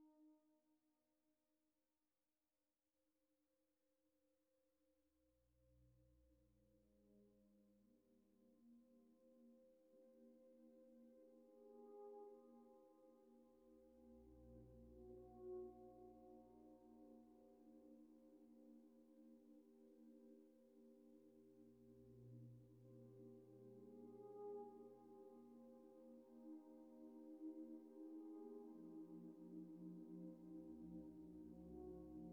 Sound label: Resonant Ambience